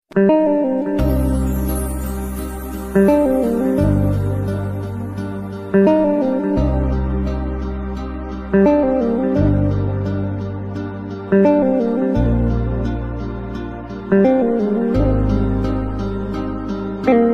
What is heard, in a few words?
arabic song ringtone